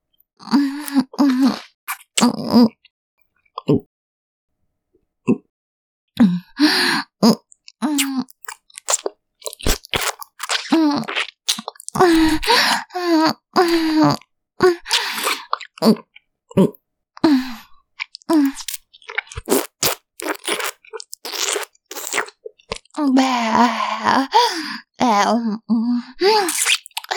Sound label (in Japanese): 唾液交換, ASMR, キス